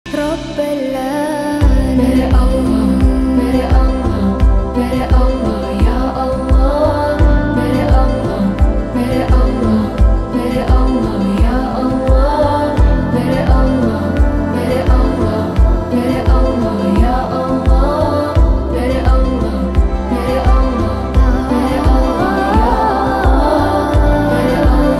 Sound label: arabic ringtone